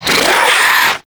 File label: Swarm Vocal, Enemies; Warframe; ManInTheWall; LimbVocals